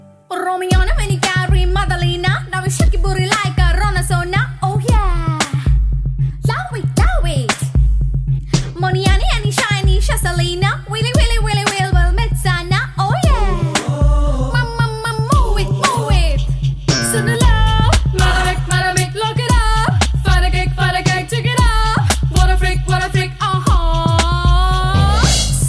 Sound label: Telugu Ringtone